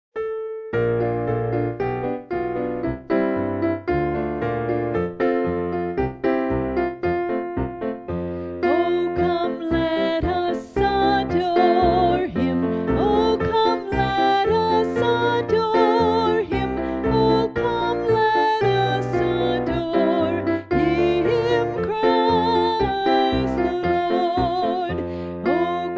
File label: oh come all ye faithful vocal